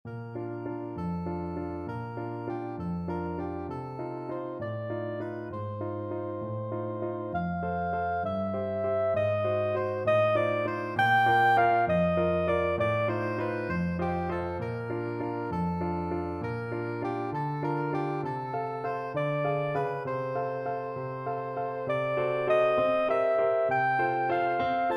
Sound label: waltz, soprano saxophone